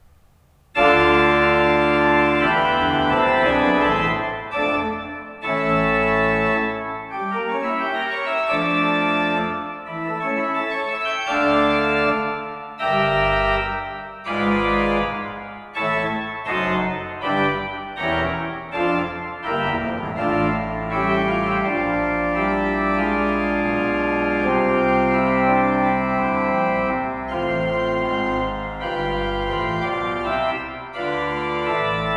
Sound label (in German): Choralvorspiel; EG 99 Christ ist erstanden